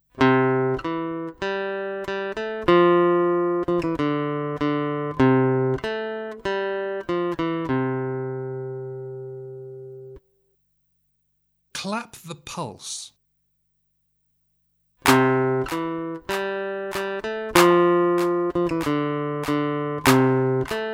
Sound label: Aural Rhythm Test, acoustic guitar; Grade 4